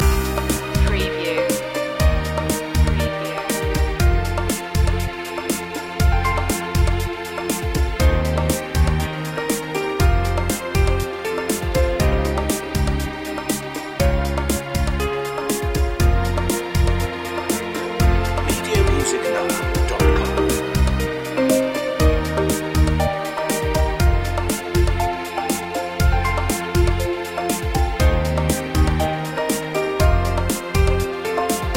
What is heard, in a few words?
string beats loop